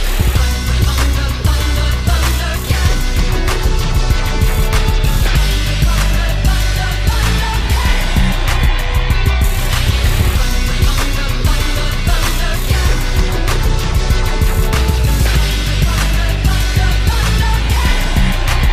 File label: Remix, ringtone